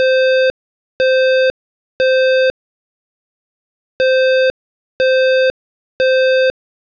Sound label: Temporal Square 520Hz L